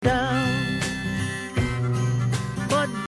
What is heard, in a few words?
Maj7 Bass